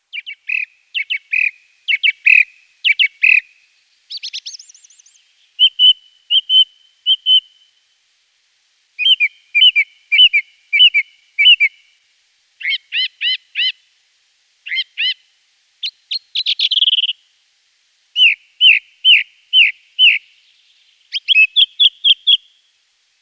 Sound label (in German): Singdrossel, vogelstimmen; vogelstimmen-trainer